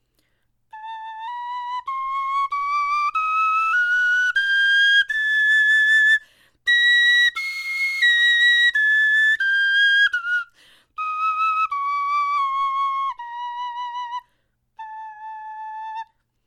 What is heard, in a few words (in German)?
tonleiter, knochenfloeten